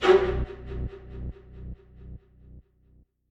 Multi sting; mcsm music; General Stings

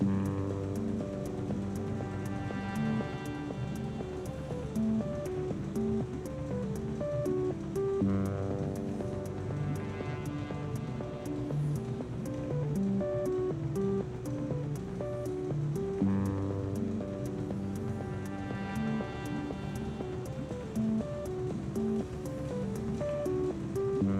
fade to drums; CommandBlockBuild C; mcsm music; Netflix

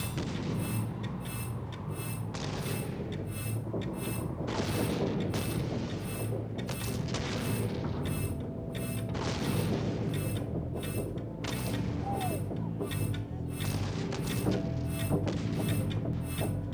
delayed electric guitar; mcsm music; Netflix

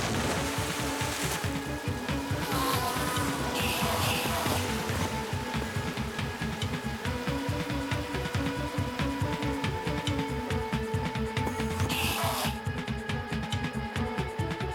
drums fade out; mcsm music; Netflix

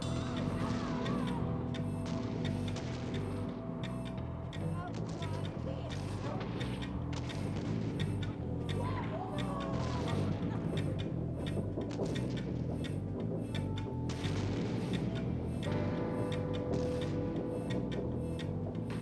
no electric guitar; mcsm music; Netflix

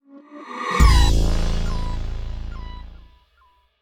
Stinger Synth; mcsm music; General Stings

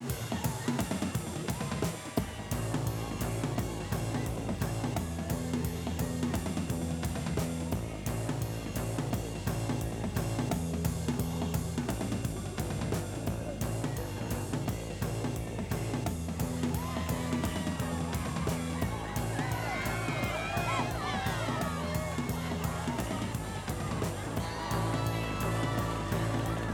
fade from drums; BoomtownTheme D; mcsm music; Netflix

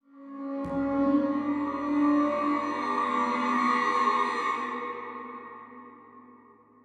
riser Synth; mcsm music; General Stings

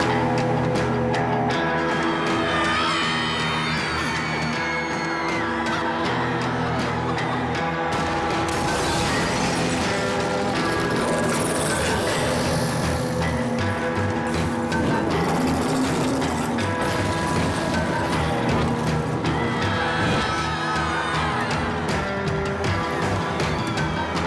D bass and drums fade in then out + fade to drums; mcsm music; Netflix; The Order of the Stone

